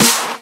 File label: snares; Vengeance Samples